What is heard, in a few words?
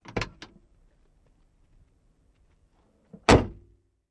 Car Door, Open Close; Large Heavy Door, Close with Dull Thud